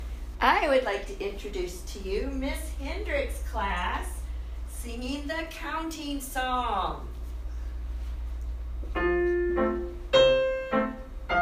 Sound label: counting song